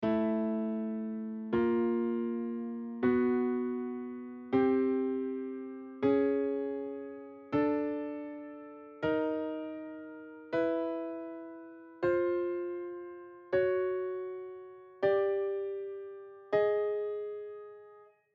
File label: All Fifths Werckmeister, TUNINGS